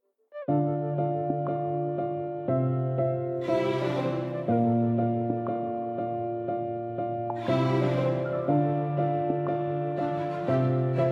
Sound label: Instrumental